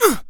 jump, Human Male; zps; HumanMale-01

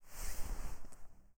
winchester draw, Double Barrel Shotgun; zps; Weapons; Firearms

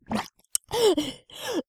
female air, Human Female Water; zps; Humans

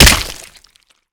Flesh Arm, Gibs; zps

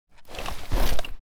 IED Throw; zps; Weapons; Explosives

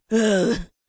pain, Zombies; zps; Vanessa

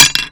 Hammer Collision; zps; Foley

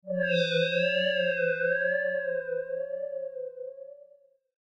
shredding synthhit; zps; Ambient

